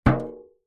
impacts metal thin foil baking tray hit knock bash; zapsplat